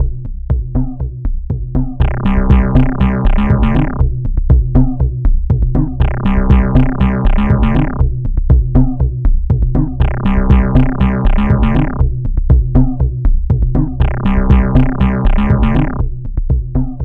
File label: Space Bass Loop